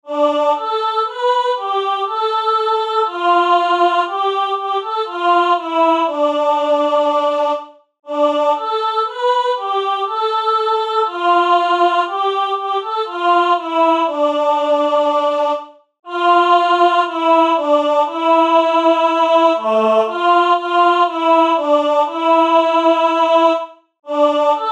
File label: Noel Nouvelet Sopran, Midi; germersheim; Weihnachten